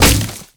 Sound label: wood fence post; Call of Duty Modern Warfare; physics